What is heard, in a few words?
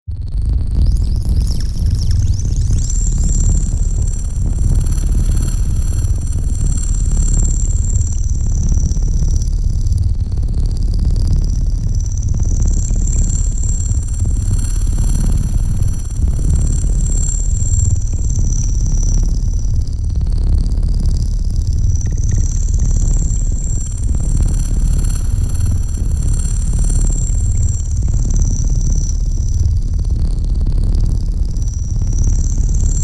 Sub Shakin Drone; Best Of N2O Library; Drones & Foundations